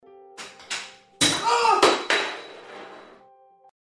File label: scream